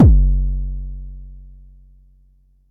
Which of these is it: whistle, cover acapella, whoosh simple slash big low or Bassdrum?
Bassdrum